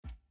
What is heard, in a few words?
ui screen notification; everdawn